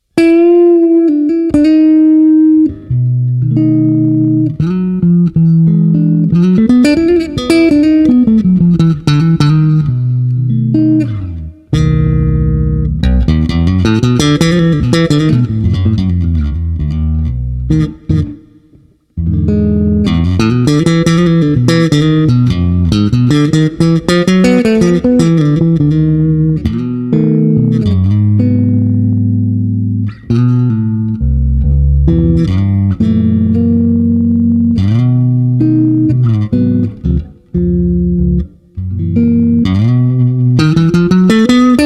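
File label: solo jazz blues, basso elettrico artigianale; Acero occhiolinato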